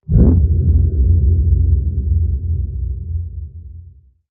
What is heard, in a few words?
Hit Low Bass Whomph